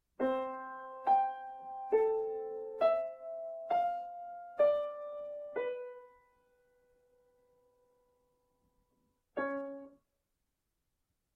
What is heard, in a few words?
Test Tones; Albumleaf 37